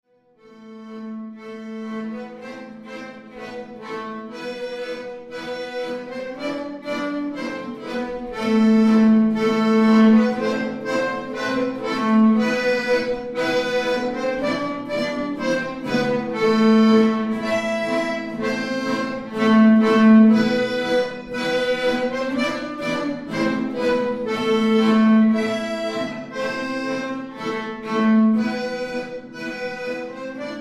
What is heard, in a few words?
polka